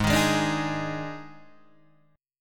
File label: D sharp Major 7th Suspended 4th G sharp